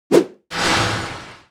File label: High elf Druid Attack